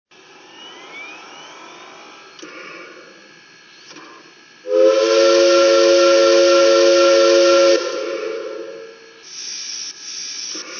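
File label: 442 Atlantic, trains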